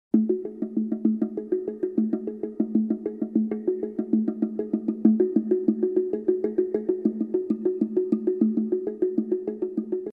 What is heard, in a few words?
African Drums